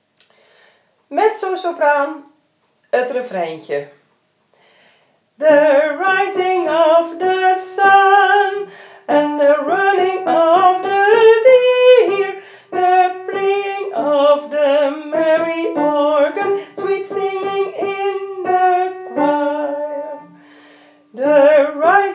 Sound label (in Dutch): mezzo refrein